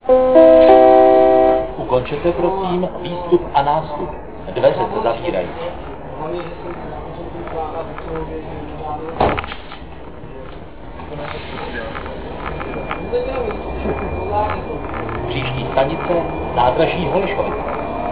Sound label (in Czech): c odjezd, zvuky metro